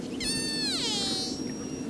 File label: orca